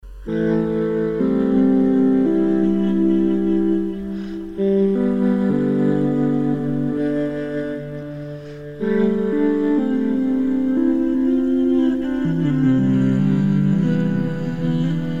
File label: First Harmony